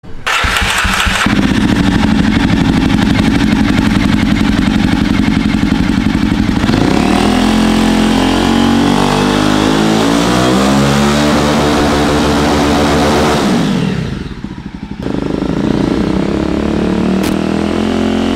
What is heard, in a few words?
Jawa Perak